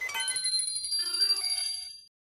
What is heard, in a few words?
menu open; sao